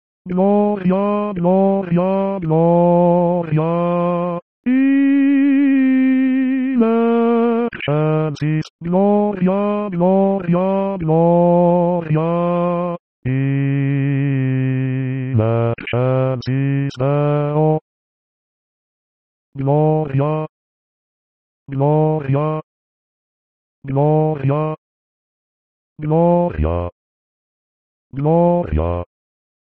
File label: basses; Gloria; Missa pro pace